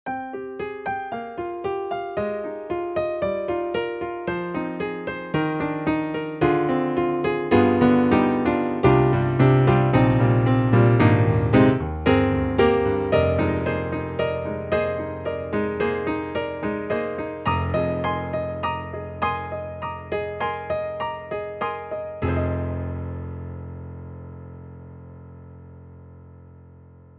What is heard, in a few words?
mellow piano; EDIROL; Super Quartet